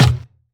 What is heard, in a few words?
Percussions